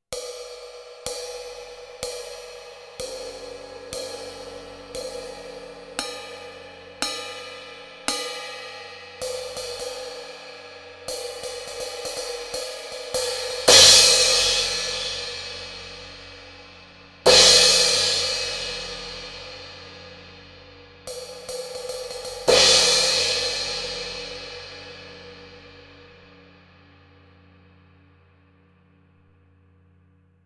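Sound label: crash BYZANCE BRILLANT THIN 16, CYMBALES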